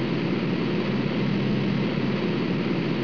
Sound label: tank idle; netpanzer